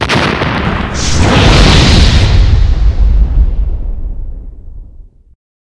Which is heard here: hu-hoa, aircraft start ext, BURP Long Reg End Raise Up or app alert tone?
aircraft start ext